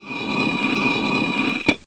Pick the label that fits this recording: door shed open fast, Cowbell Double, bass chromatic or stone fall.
stone fall